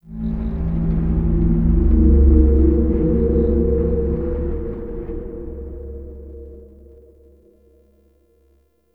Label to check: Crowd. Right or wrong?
Wrong